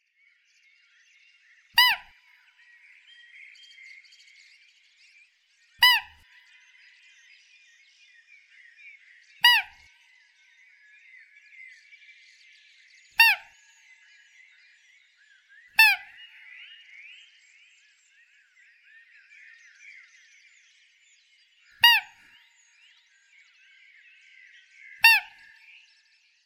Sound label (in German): Verhaltener tief angesetzter Fiep REE; reefiep; rottumtaler wildlokkers